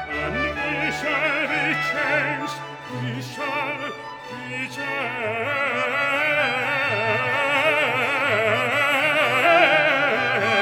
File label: trumpet end